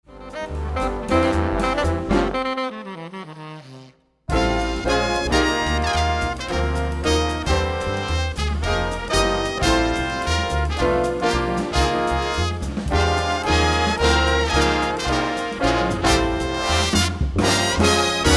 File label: Zydeco